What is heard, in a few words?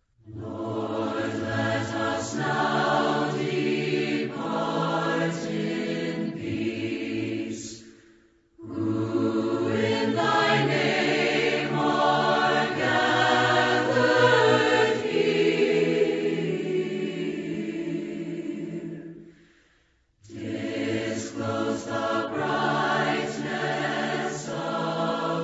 a capella hymn; lord let us now depart in peace